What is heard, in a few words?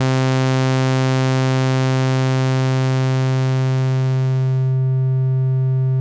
default morph